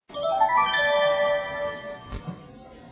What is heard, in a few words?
airport